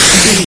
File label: retro spaceship jet boost